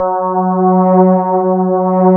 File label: electric pianos; Wizoo Electric Pianos